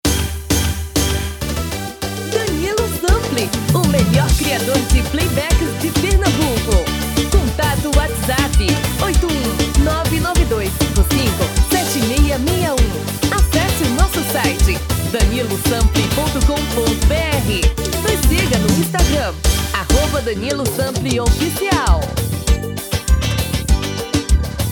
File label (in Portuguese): axé